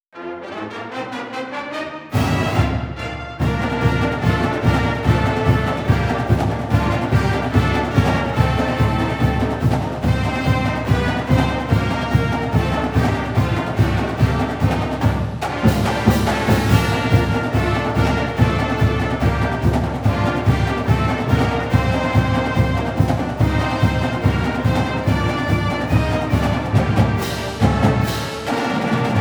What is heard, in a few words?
MARCHING BAND; Hail Indiana